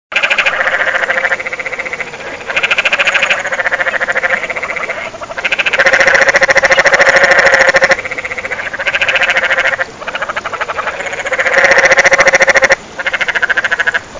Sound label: Canyon Tree Frog